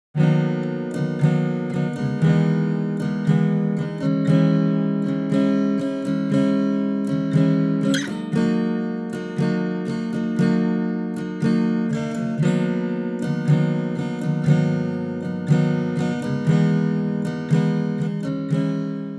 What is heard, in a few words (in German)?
Gitarre alt und neu